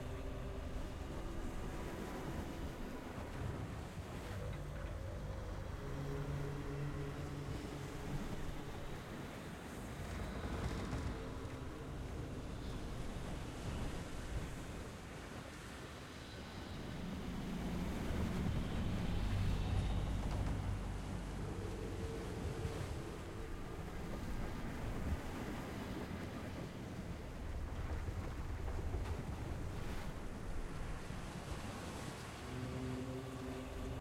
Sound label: amb shadowisles coast